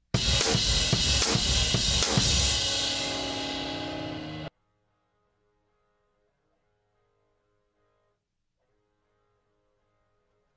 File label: Cymbal